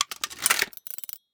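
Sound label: Machine Mech Steampunk Gadget Reload; David Dumais Audio; Steampunk Mech SFX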